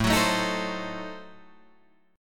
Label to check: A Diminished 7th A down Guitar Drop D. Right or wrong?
Right